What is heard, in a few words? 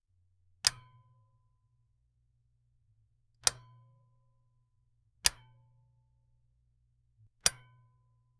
metal toggle switch long